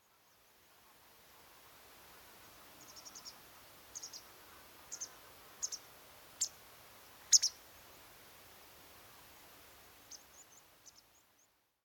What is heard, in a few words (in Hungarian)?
barázdabillegető Motacilla alba